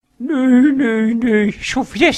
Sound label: German Sms Ringtone; German Sms (2)